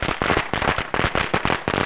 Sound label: CRACKER, 爆炸; 爆炸系列声音素材